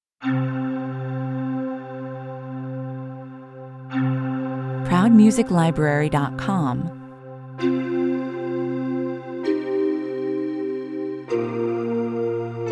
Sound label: ancient ruins (without percussion) 129 bpm